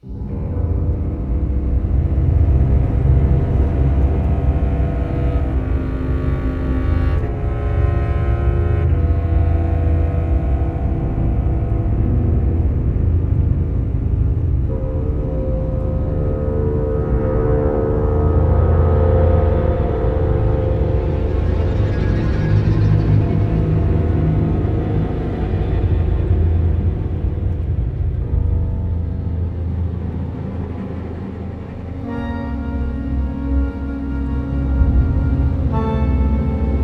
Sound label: Dead Swamp; Albion Online